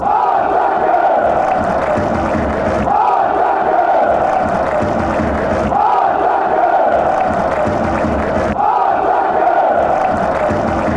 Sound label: attack, fanchants